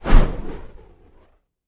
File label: wing; Smite; god Horus